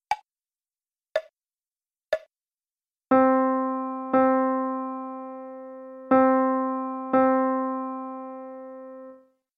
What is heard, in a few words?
rhythms